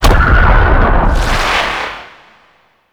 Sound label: Infested Radio Tower Speaker Wave; Warframe; Ambience; Nineteen Ninety Nine